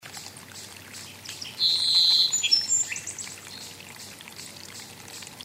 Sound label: Song Sparow